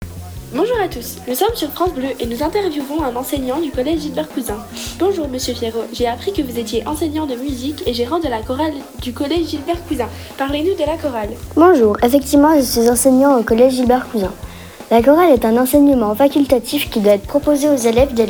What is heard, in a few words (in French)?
Chorale; Théâtre Charles Vauchez de Censeau